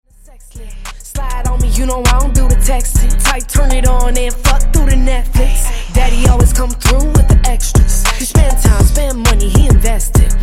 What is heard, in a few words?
Funk Segue 100 Bpm